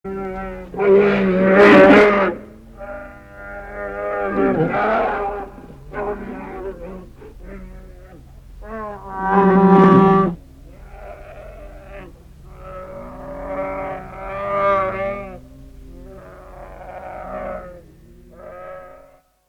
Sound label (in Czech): medved hnedy